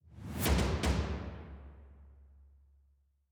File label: Survival Bowling - Round Results Start (Jingle); Nintendo Switch Sports